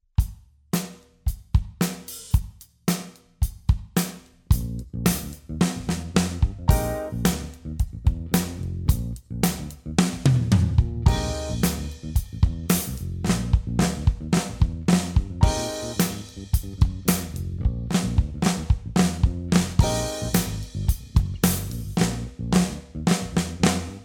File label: Pop, Drum Fills; Essential Drum Fills; Erskine